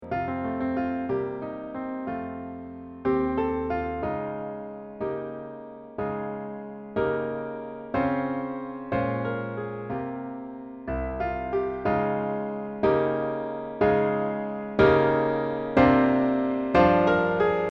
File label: break o sea accomp